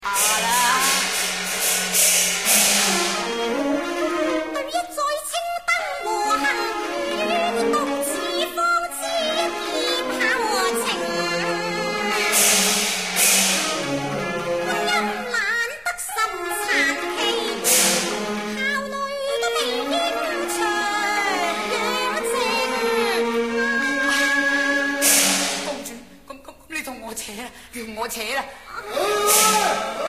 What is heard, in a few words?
Canto Opera Hong Kong